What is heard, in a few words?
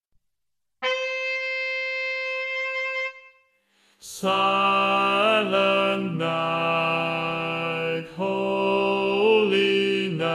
silent night lead